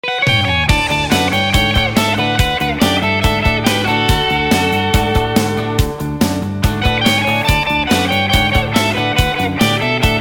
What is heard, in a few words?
(D) No Backing Vocals